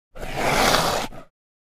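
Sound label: Tiger Hiss Deep Hollow; big cats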